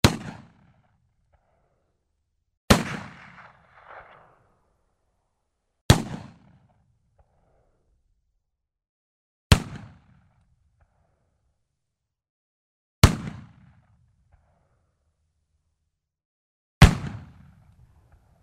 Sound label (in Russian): vystrely iz ruzhja